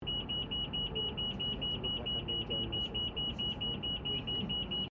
Trolley Motorized Luggage Train Station Siren Isolated Loop, Electric luggage trolley